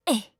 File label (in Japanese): 攻撃